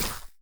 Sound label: place, dried ghast